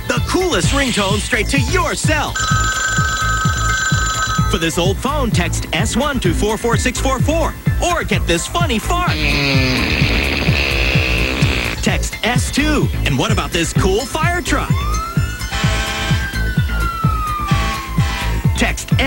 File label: RINGTONE